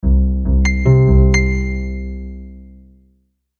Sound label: Logo Bass Piano Melody; Sonic Logo; Blastwave; Sonic Logos; Production Element Imaging Element Accent Transition